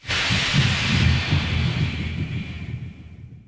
fissure bubbles

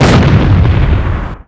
ast exp; ast miner

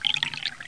wine

